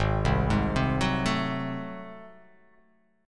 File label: G sharp 7th Flat 9th G sharp down, chord